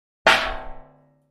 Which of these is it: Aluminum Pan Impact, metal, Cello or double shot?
Aluminum Pan Impact